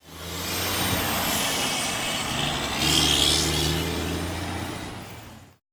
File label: truck exhaust